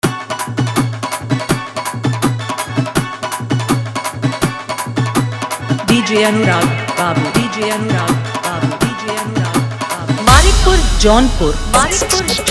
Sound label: Full Dhollki Bass Dance Mix